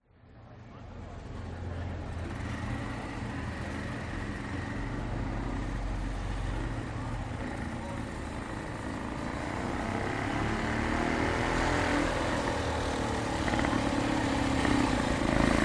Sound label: motorbikes old fashioned ralled pass by then idle voices Germany